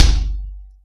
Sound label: Low Floor Tom